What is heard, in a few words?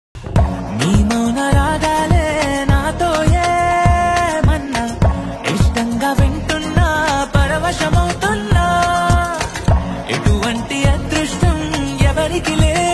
telugu ringtone